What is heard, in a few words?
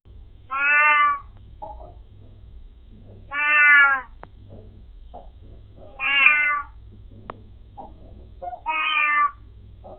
a wise owl says its